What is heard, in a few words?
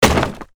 break wood; broke a block placed by a survivor